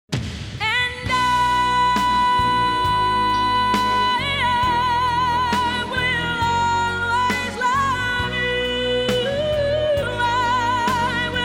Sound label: applause; o!mania v3 (Bars)